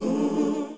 "ang", Voice

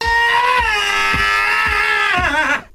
scream DRUMS; Voice

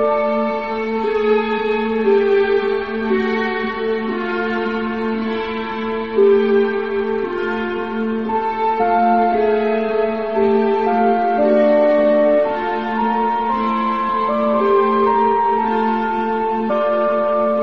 keys, sad loops

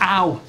ow

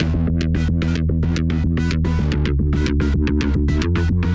breath song, Loops Electronic

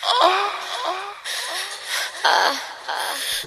female orgasm

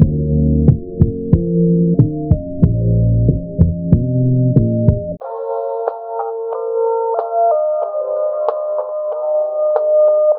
keys, phonky loops

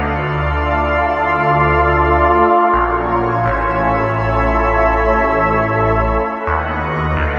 gothic atmosphere; Loops; Electronic

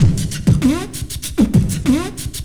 -, Beatbox; Drum loops

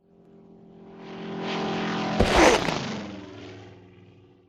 skydive, Vehicles

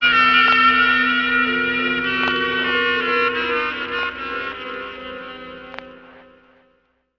alarmed, Stabs

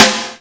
Snares Real

